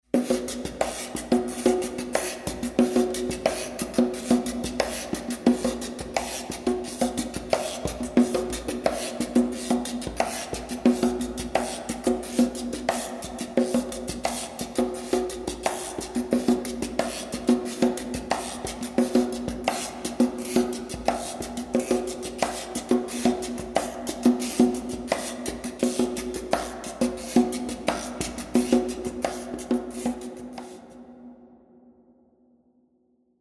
Percussion LXP Plate

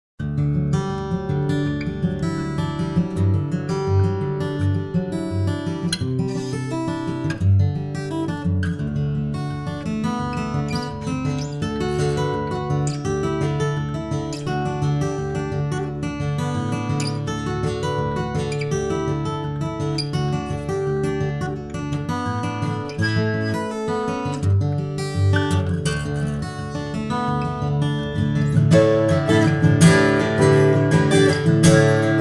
Acoustic Guitar LXP Room

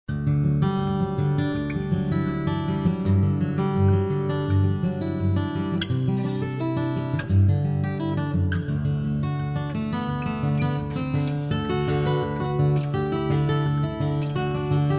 Acoustic Guitar Hall

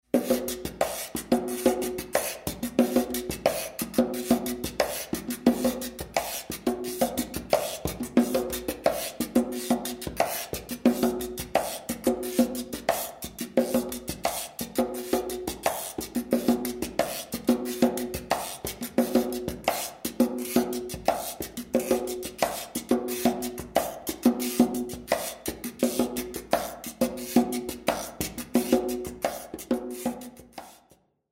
Percussion Chamber

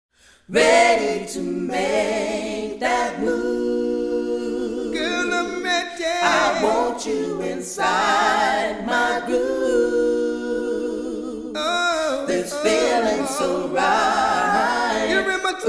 Vocal Group Plate

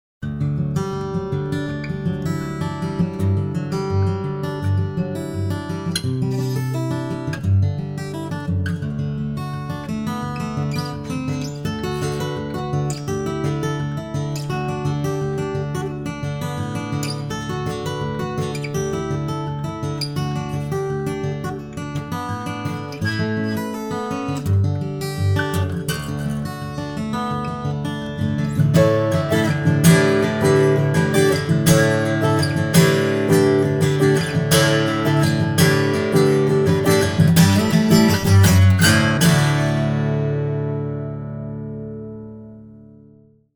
Acoustic Guitar LXP Chamber

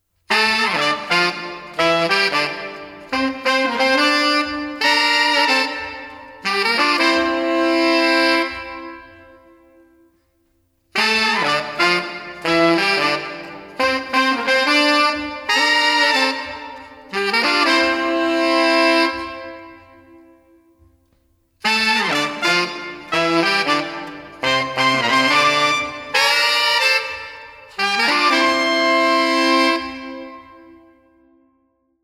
Horn Section Chamber

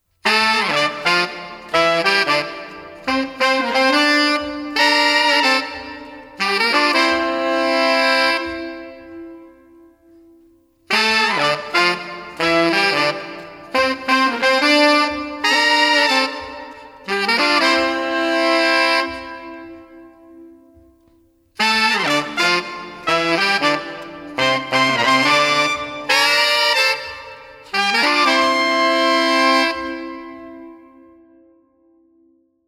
Horn Section LXP Chamber

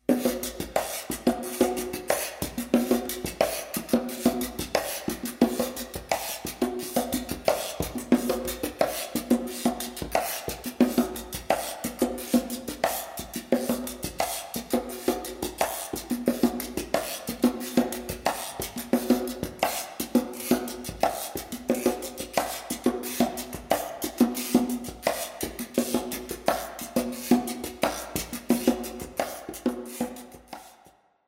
Percussion LXP Chamber

